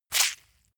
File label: leather impact mud medium squeeze